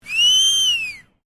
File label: FEMALE WHISTLE LONG; Female vocal sounds; Whispering Sound Effects; Whispering (Sound Effects:Voice)